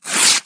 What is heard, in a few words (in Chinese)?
撕合同